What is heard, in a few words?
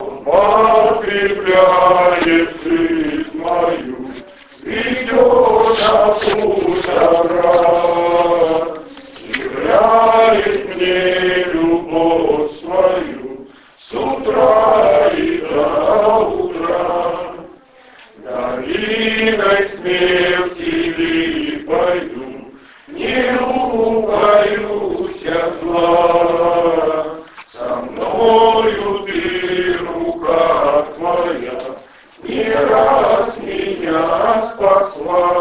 Worship Traditional Russian Hymn